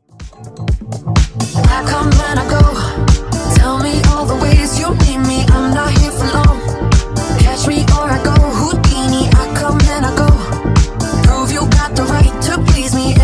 Funk House Rnb 125 Bpm

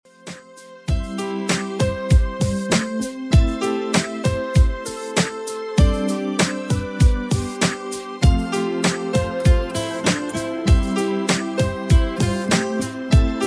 90s Redrum 98bpm

